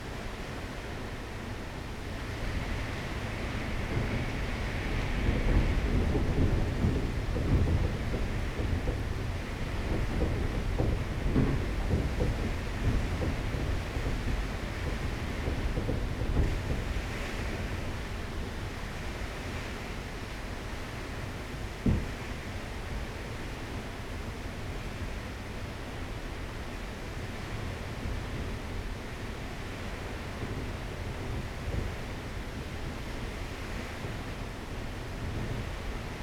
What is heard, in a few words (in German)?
Sturm vor der Tuer; Curacao; bei Sturm; Fieldrecordings; Rode NT4